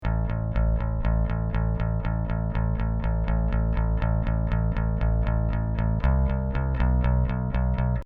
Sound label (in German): bass unbearbeitet